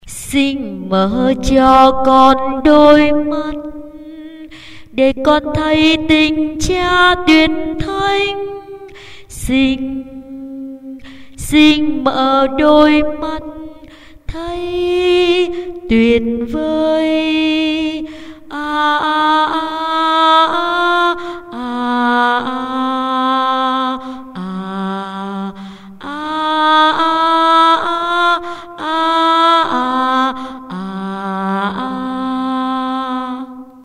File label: basso